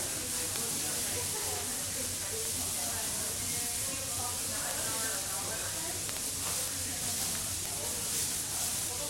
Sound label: on the floor